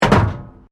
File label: METAL BIN IMPACT PLASTIC CRASH BARRIER